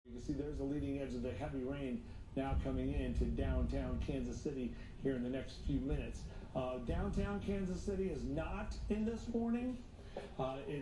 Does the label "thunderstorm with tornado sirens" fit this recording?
Yes